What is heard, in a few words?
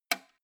old switch harsh snap